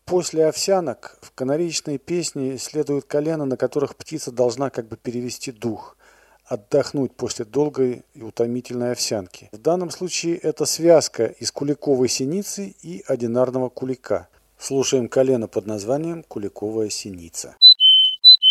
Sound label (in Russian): siniza